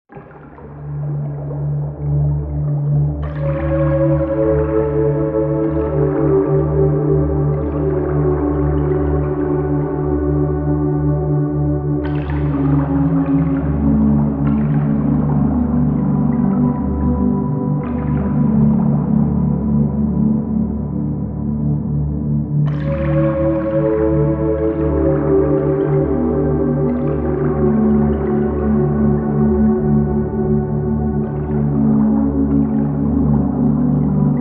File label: Binaural Tone